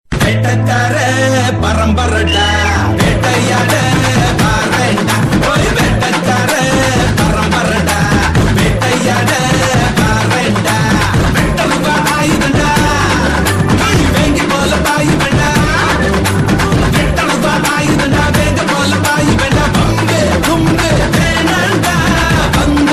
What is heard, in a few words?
Vettaikaran Song; New Vettaikaran